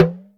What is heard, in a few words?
TalknDrU, Percussion